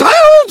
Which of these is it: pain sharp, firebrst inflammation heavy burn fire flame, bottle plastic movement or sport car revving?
pain sharp